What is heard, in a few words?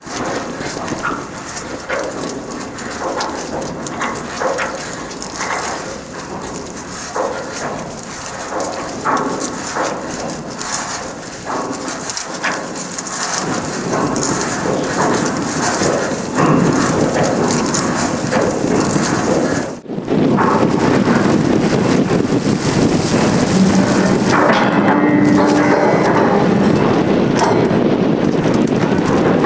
anchor being raised; aurora